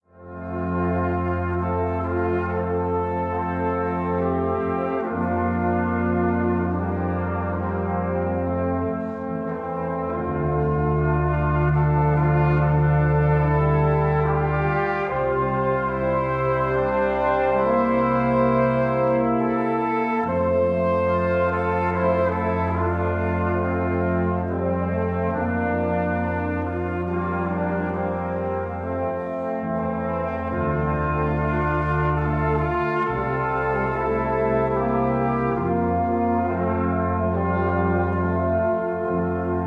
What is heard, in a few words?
Meditation